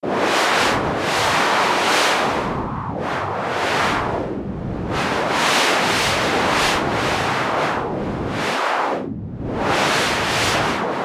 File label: noises